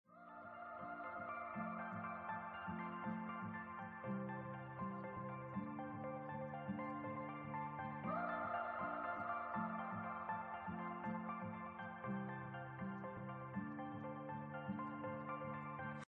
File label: bg music intro